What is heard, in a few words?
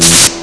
spark, electric; hl2